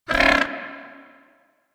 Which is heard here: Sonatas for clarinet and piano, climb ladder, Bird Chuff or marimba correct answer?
Bird Chuff